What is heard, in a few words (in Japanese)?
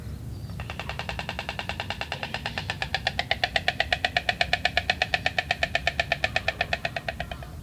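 shubashikou, シュバシコウ 鳴き声; コウノトリ Ciconia ciconiaの亜種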